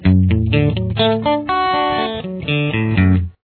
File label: Arpeggio D Open, guitar; CAGED Open Arpeggio Shapes